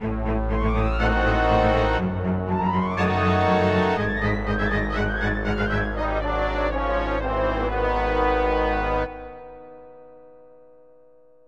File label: Race Fail; Dinosaur Planet